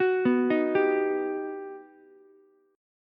G Augmented Major 7th, Ukulele; G+M7 arpeggiated; JGuitar

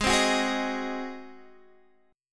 G sharp 7th G sharp, Guitar Standard; G#7; strummed; JGuitar

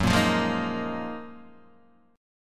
F sharp Major 13th, Guitar, Open D

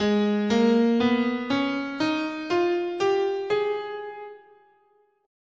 Melodic Minor, scale, guitar; Gsharp

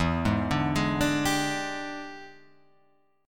E 7th Sharp 9th, chord

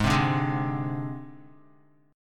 Ab Major Flat 5th Ab Guitar; Listen to AbMb5 strummed; JGuitar

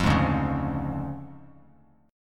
D Minor Major 7th Flat 5th D Bass Standard; DmM7b5 strummed; JGuitar

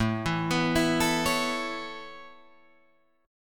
A Major Add 9th A Guitar Drop D, chord

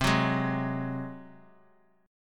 Gb Suspended 2nd Flat 5th C

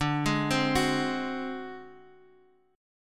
D 7th Flat 5th, Guitar DADGAD

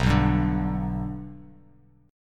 B Minor Sharp 5th B Bass Standard, chord; Bm#5 strummed; 7,2,5,7; JGuitar

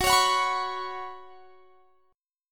F sharp Suspended 4th; strummed; x,21,23,23,24,21

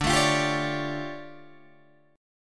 D Minor Major 11th, down, Guitar, Open G

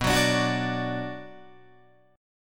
B Minor B Guitar DADGAD

